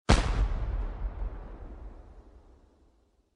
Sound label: cannon shot